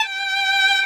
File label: violin